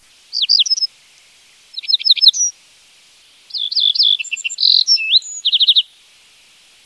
Little Bunting, songs

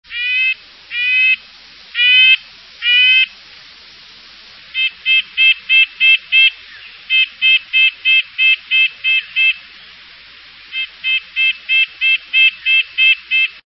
Red breasted Nuthatch

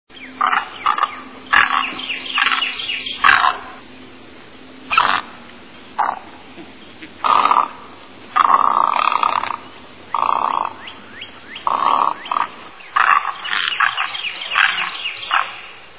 Great Egret

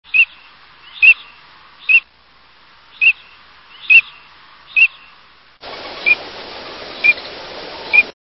Green winged Teal, songs

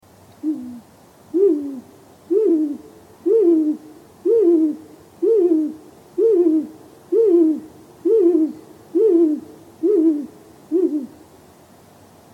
Great Gray Owl, songs

